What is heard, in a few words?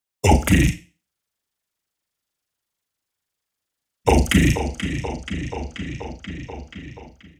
Vocal Loop Ok 125 Bbm